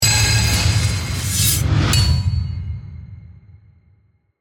Hit Metallic Comp, Hit, Metallic, Composition, Reverse, Scrapes, Accent, Transition; Production, Element, Imaging; BlastWave